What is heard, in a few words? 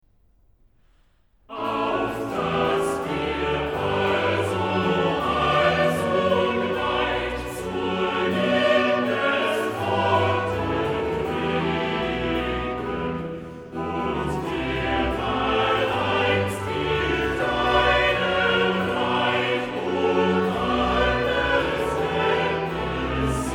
Chorale; Es ist ein trotzig und verzagt Ding, BWV 176; Bach, J S